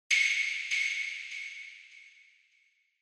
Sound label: Single Accent Delay